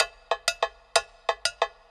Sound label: PERC LOOP ETHNO 125